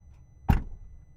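lamborghini huracan onboard door close key rattle engine left; MKH8020; 2014; Pole Position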